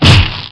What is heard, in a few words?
melee; rogue; stalker